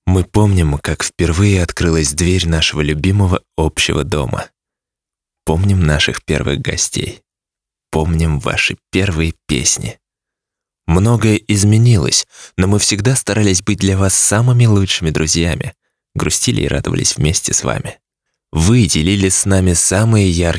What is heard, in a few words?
music hall